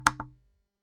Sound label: wooden